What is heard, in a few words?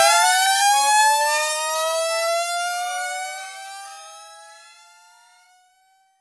digital prize wheel; interactive